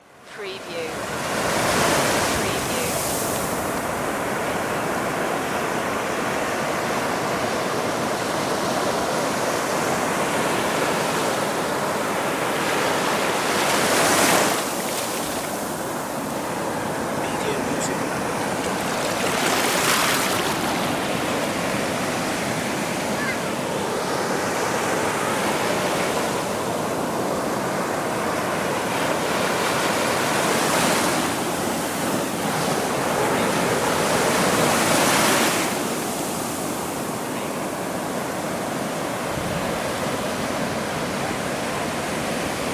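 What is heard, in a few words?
Waves Ocean Windy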